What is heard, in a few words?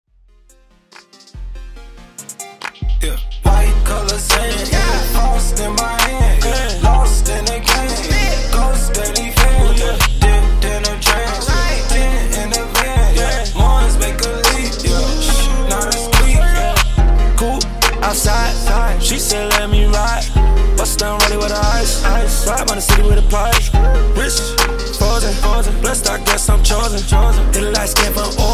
71 Bpm; Dj Intro Outro